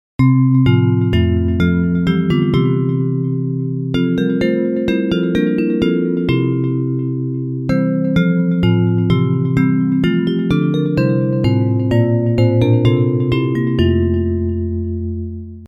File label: Bells